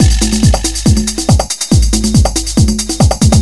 Arreebaaa(140), Beats

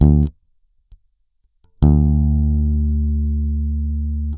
Bass Oct D, Standard Bass, Fingered; Future Music

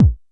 Hard kick; Drums; audiomulch; simplekit

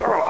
TACKLED

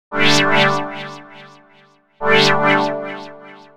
Synt; FUNKIN&GROOVIN'

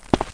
walking; nikole

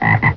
frog

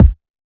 bd HipHop; E-Lab; Computer Music

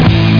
hvy short; tanc

